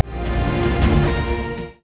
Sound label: Sail restore down